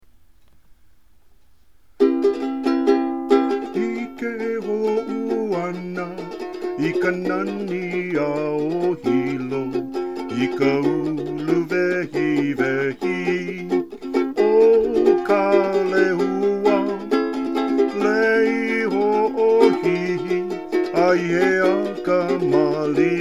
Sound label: march; hilo